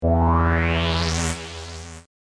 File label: Hip Hop Synth Licks 90 Bpm E; Hip-Hop 90 BPM loop elements; Synth Loops; O-Yeh; Royalty Free Synth Loops